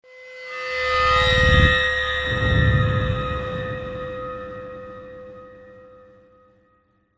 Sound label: Eerie Swell; Saw The Video Game